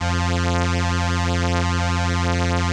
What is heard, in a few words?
ELKA, String Machines; Best Service; XX Large Most Wanted 2 String Machines